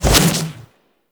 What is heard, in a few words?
Melee Jump Slam Charge; Warframe; Weapons Melee; Whooshes